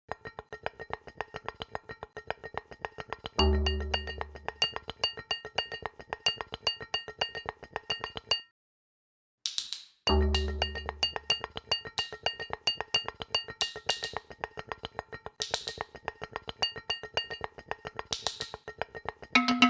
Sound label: bell beat